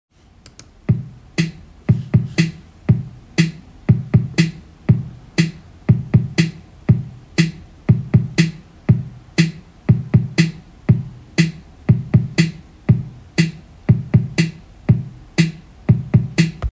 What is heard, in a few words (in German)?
Begleitrhythmus